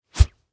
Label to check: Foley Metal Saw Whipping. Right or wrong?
Right